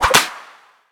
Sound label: whip